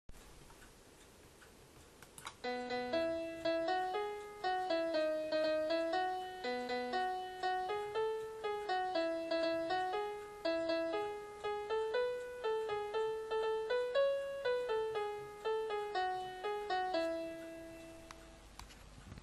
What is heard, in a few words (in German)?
Intrumental